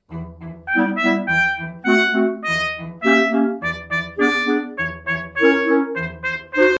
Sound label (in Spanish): todos tutti